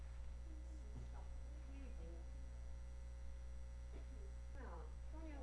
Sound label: hammer